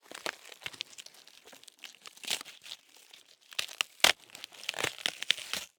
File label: Cardboard, honeycomb, crush, rip, tear, twist, long; Eiravaein Works; Vaeyan IV